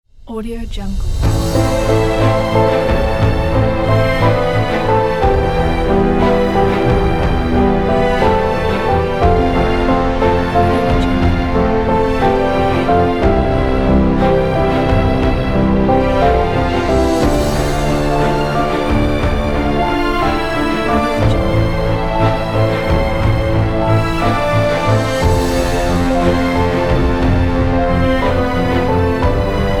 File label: beautiful epic melody